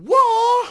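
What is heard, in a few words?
Archer Attack; Sid Meier's Civilization 4 Complete; Warlords; Units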